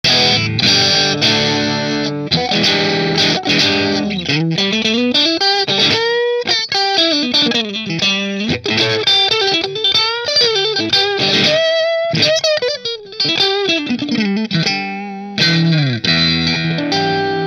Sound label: position 2 marshall; Silverado